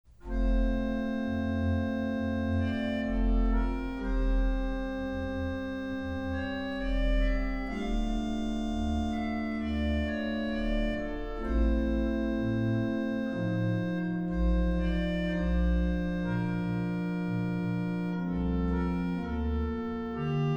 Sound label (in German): orgel